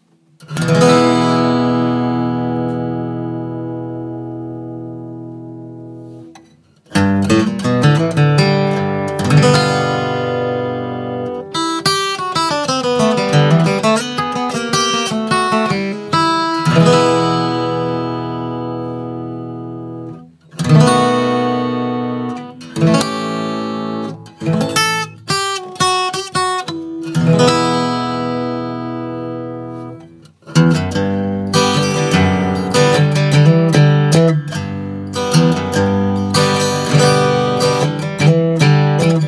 epiphone ft 79n texan natural; 1964

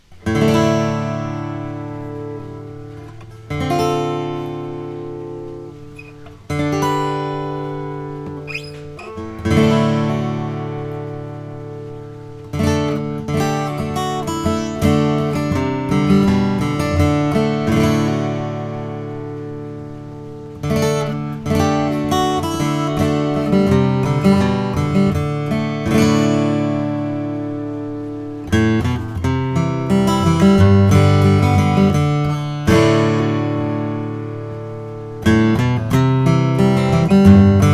Fairbanks F 35; roy smeck vintage sunburst

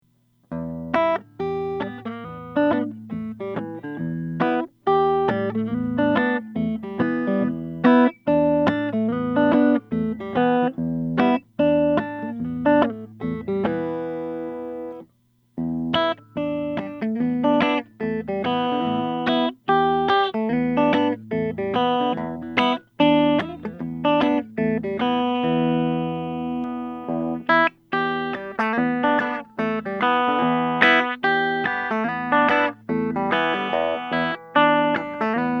gibson es 335 translucent brown

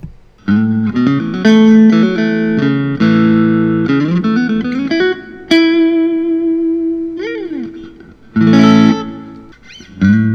suhr classic ssh; fiesta red

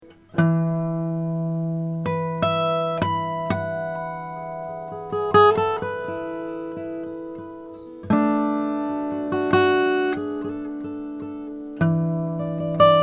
bruce sexauer ft 15 australian

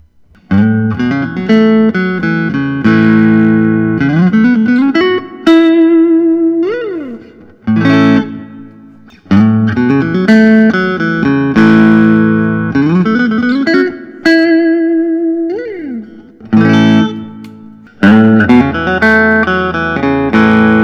grosh nos vintage t 2tsb

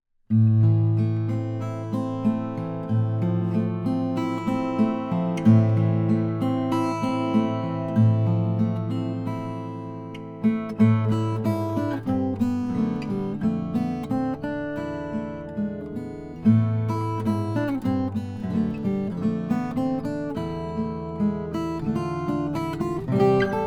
lowden sinker guatemala; winter 2021 ltd ed

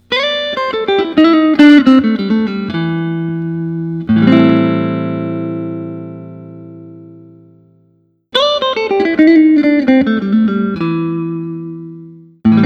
dpergo semi hollow aged; 2008

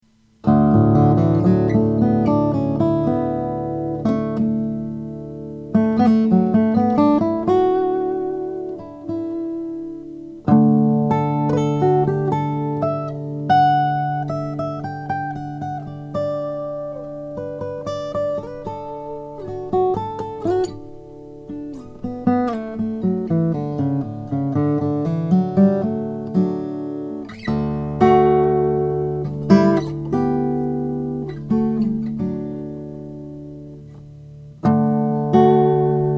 collings om2h mr madagascar

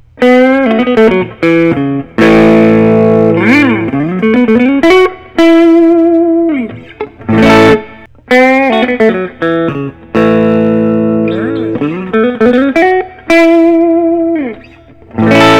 Gibson historic 55 to 59 lp

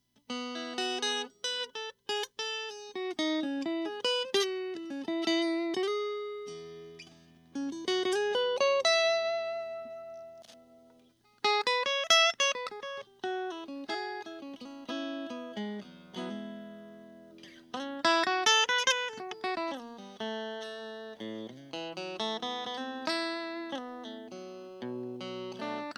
taylor t5 custom koa

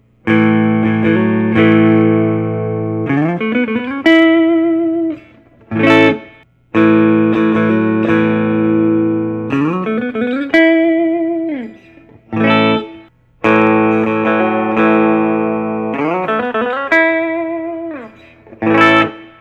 Collings I 35LC; with ox4 pick

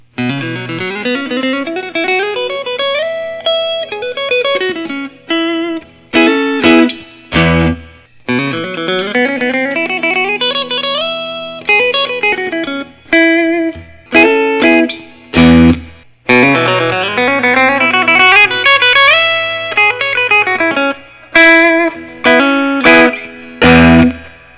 lentz reserve t style; brazilian

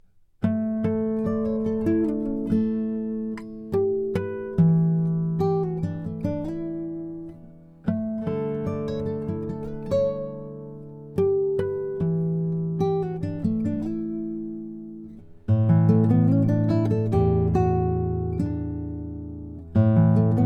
taylor 712ce lutz spruce top

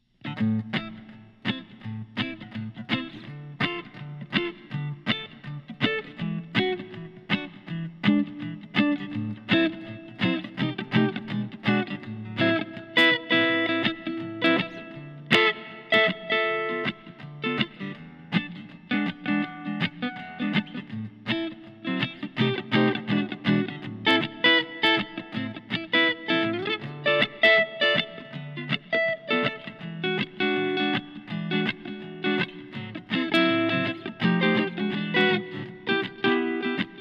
santa cruz fjz 17 german maple

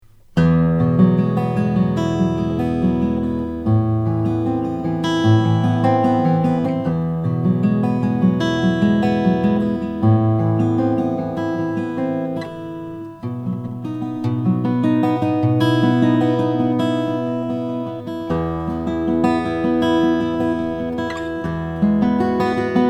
applegate brazilian

